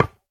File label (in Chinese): 骨块破坏声